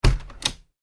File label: household window double glazed shut; Window Shutting ( Double Glazed ); Window Closed (Double Glazed)